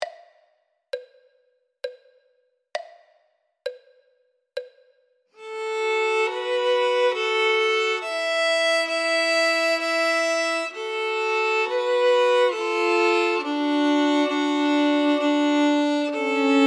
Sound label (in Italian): violino I e II